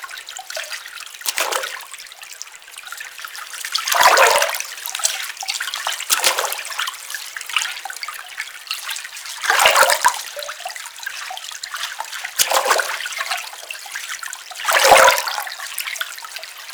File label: Hut Interior Drinking Well Loop; Midrath Island